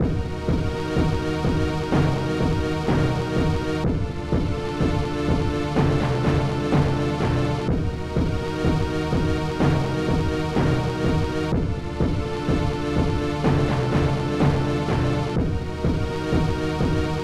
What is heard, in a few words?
Music; breaking bricks